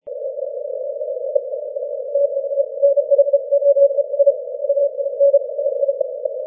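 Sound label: 30m continuous wave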